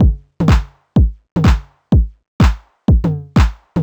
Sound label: drum hurtme stripped 125, Drum Loops, Classic Chicago House